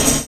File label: ROLL; Univers Sons - Kitch 70s